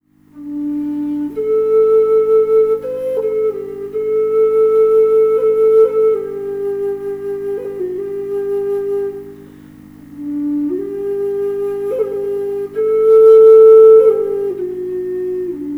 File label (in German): Klangprobe D moll Flöte